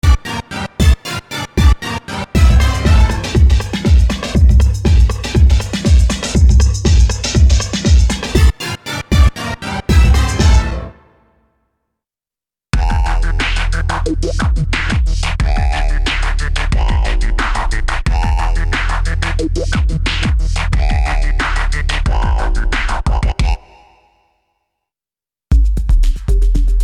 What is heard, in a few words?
Roland JV; roland-cartessons